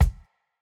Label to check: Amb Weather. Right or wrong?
Wrong